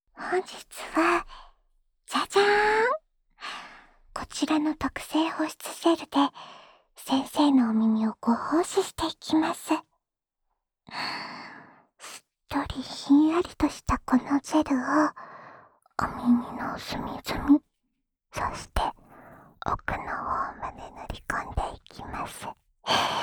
安眠用オイルマッサージループ（右耳）

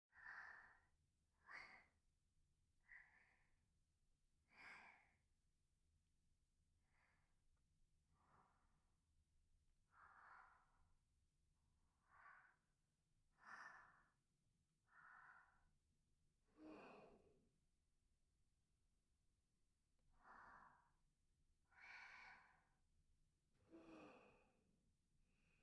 添い寝 吐息 ループ音源; 和み処 一番星～距離感の近い甘えん坊な仲居『月さん』が一生懸命ご奉仕する癒しの 一日～; 月の吐息&寝息 ループ音源

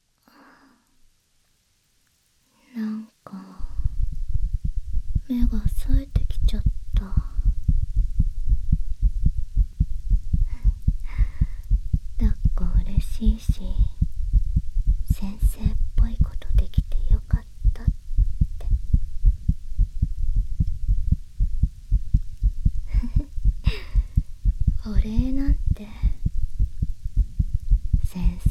耳舐め, ASMR; 先生の甘えん坊耳舐め

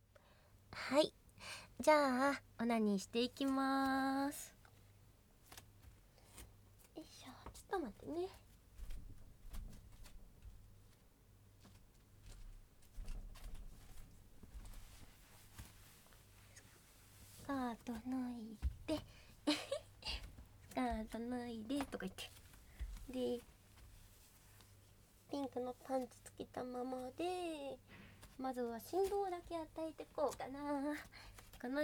実演オナニー

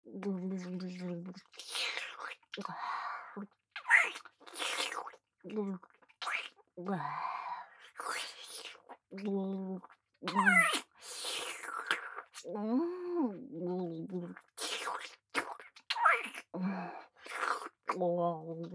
アナル舐め; ネモナ; ちんぐり返し状態

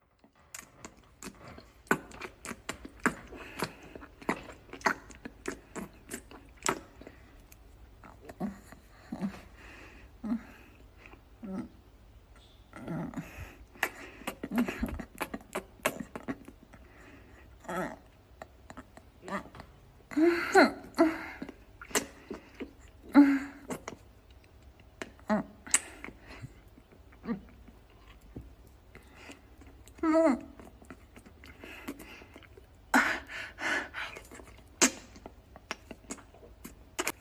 美人キャバ嬢のフェラチオボイス; 性欲処理させられる

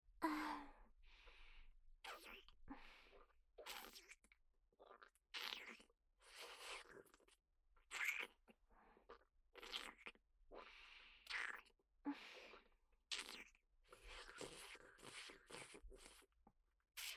フェラチオ, ASMR; アドリブ集

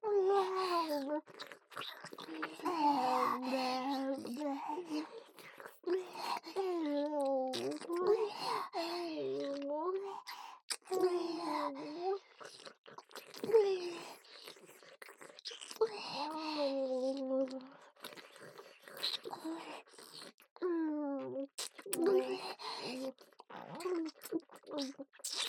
Wおちんぽれろれろ; おまけループ（オナサポ）; フェラプレイ

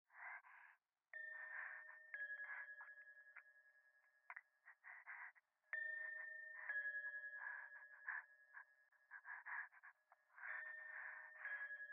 右耳快感吐息 神社

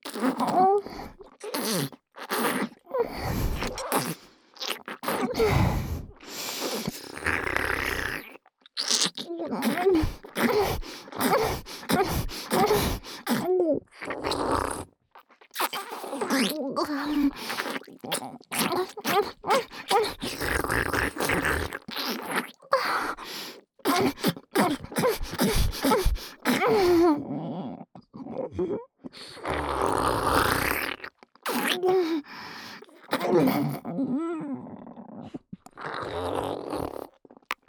耳フェラ 右耳 激しい耳フェラ

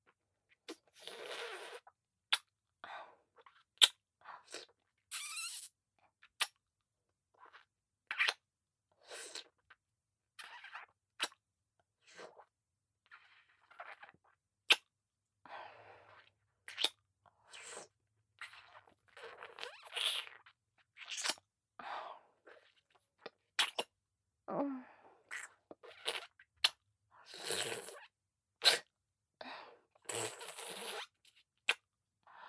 乳首舐め(吸って離す)

